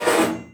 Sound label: move, hinges drawers; Half Life Alyx